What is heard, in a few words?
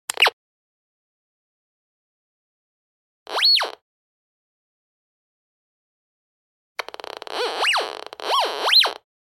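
metal detector sound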